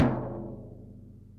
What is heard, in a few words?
Floor Tom Held